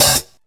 TICTALK DRUM

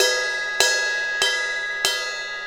Ride Cymbal Pattern

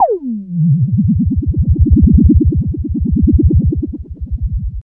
FX SWEEP, SYNTHSWEEPS

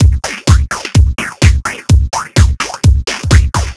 Funk No Hats, Drum Loops 127bpm, Nu Dance Electro; Zero-G; Soundclash Nu Dance Electro; Acidized Wavs; Jumble Funk

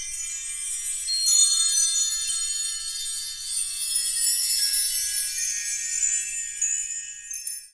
BELL TREE; 3D Sound; 3D Keys VOL-1

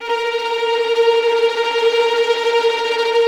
VI TREM F

